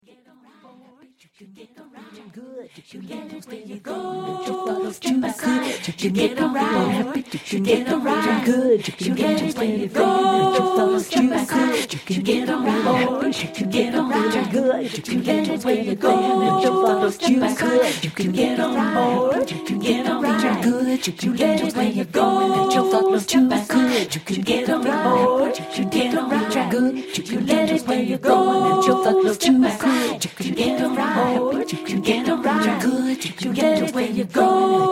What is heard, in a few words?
natural rhythms